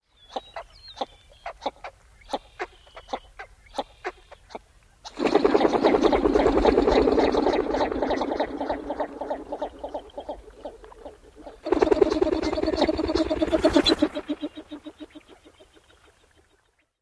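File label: Northern Shoveler, male calls and flight whistle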